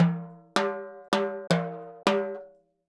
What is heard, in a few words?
Timbali, Latin Percussion